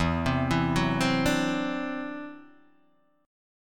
E 7th Suspended 2nd Sharp 5th, E, down, Guitar, Open D